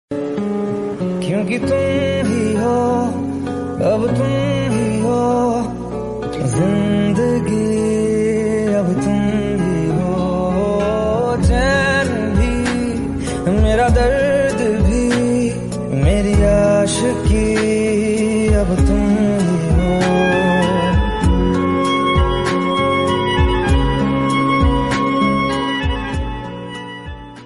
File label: sad song ringtone; aashiqui 2